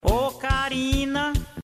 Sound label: okarina